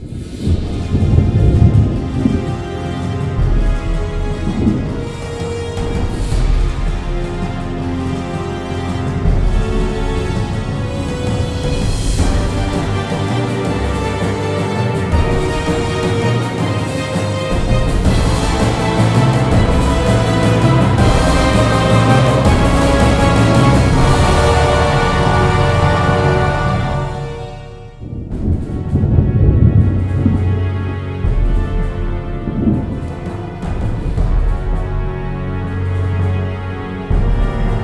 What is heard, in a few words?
Run Storm; pippa funnell 2 take the reins ps2 windows gamerip 2006